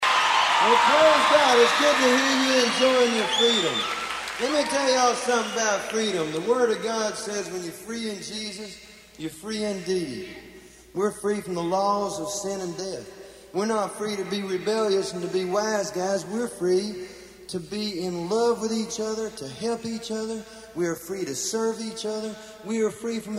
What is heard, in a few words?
Free Rap